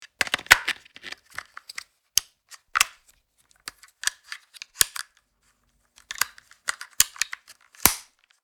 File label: Remote TV controller changing battery; appliances